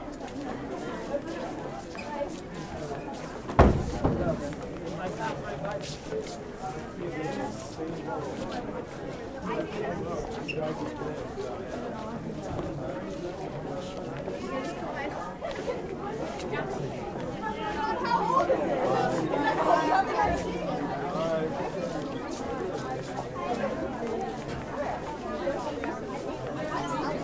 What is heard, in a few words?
lobby; Golden Crayon Sounds